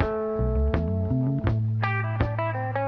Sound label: guitar, Loops, Phonky